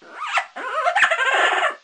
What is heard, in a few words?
bird green; minecraft; technic